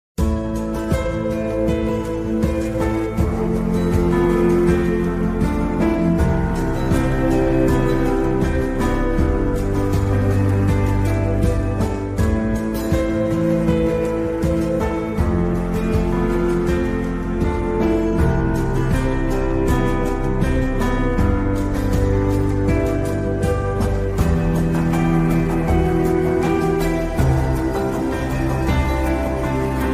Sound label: High Notes; DBD